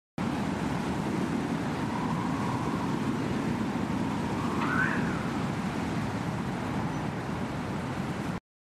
loud wind

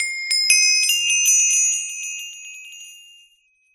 minecraft levelup

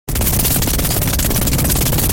clap clap

